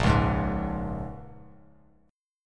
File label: A sharp Minor 7th, Bass Standard; strummed